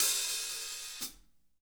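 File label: hat open close, drum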